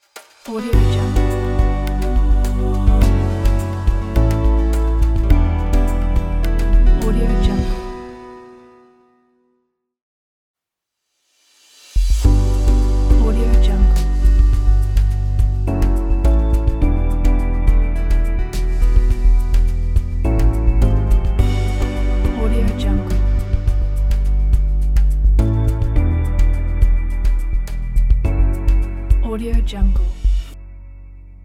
calm logo

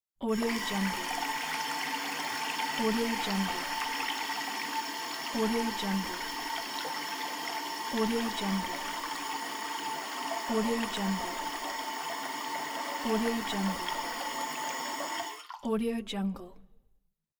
faucet tap

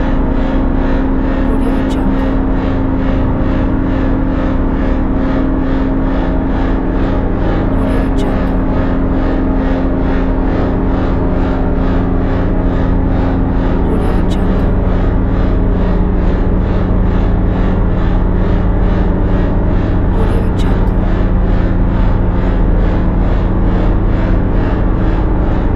industrial dark drone loop

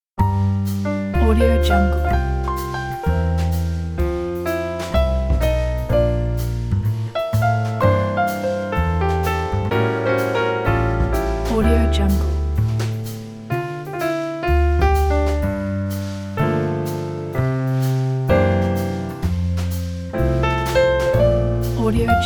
slow jazz; mezzosound

